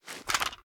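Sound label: reload extended mag rattle; gmod; viper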